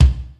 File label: old school rich mids kick drum c key; tuned drums